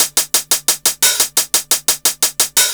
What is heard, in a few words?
Hat 175, Drum&Bass Perc Loops; EZRollers